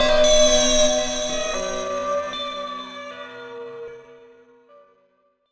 Bellish; Sonic Foundry (Sony Creative Software); Synchro Funk

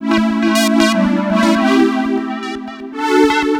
2 Step 133 SYN, House

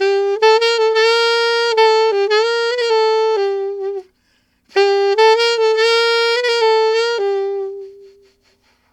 Alto Swing G min, Alto Sax, Jazz; Sonic Foundry (Sony Creative Software)

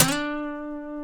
E funk, Guitars; Spectrasonics; Hans Zimmer Guitars VOL-1; TAKA FUNK

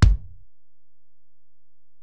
Ac BD, Alesis DM-PRO; 300 Drum Machines

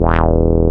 101 BS2, Vintage; INIS - Vintage

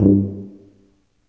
TUBA, Tuba stacc; Roland L-CDX-03 Disk 2

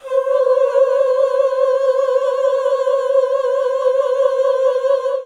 OOH C4, GOSPEL; Best Service; Hallelujah; GOSPEL 10

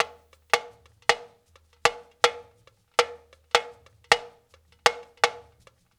Cowbell, Percussion Loops; Ambient Grooves

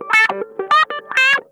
STRANGLED, Funk Guitar; Zero G - Funk Guitar

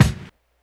kick, Hardcore Breakbeat; Big Fish Audio; Hardcore Breakbeat Action

